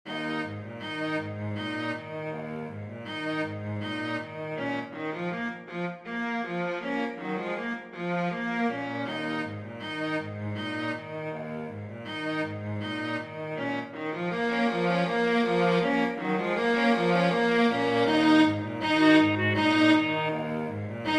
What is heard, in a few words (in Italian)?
QUINTETTO PER CLARINETTO ED ARCHI